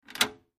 Dial Switch Rotated; switches

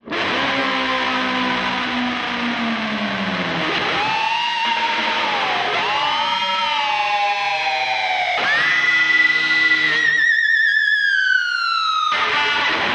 Music E Guitar Weird

